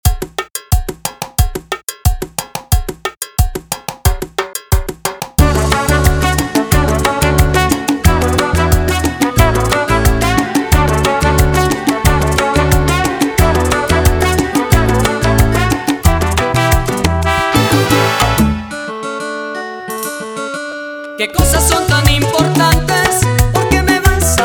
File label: 90bpm, Intro Outro